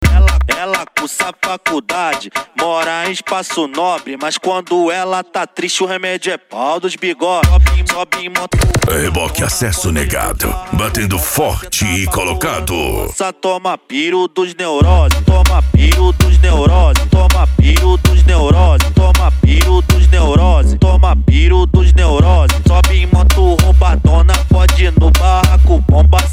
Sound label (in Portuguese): racha eletronica e funk mega bass